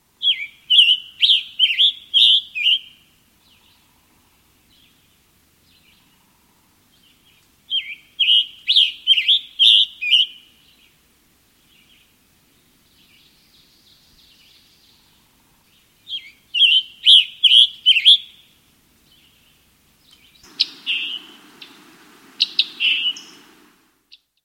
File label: scarlet tanager